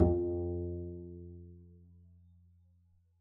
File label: pizz f2, double bass; dsmolken